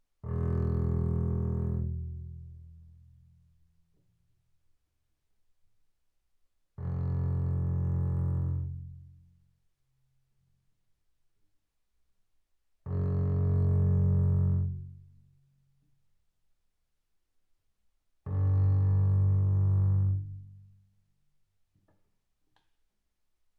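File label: Bass arco sul E fortissimo E1 Bb1, double bass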